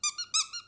rubber toy

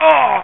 male hurt

